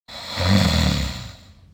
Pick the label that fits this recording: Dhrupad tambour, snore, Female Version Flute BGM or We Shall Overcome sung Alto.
snore